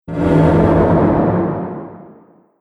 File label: Horror Explosive Impact; Miscellaneous Impact Sound Effects